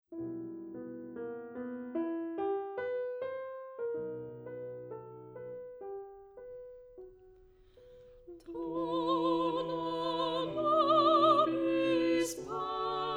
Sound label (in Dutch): Tenor, Agnus Dei; Rossini; oefen CD; Onversterkt